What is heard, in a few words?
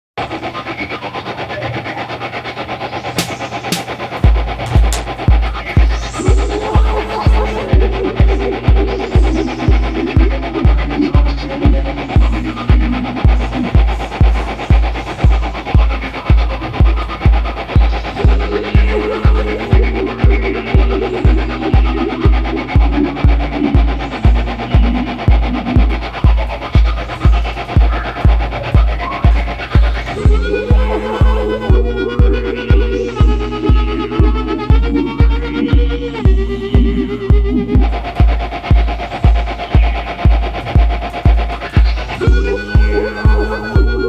Lullaby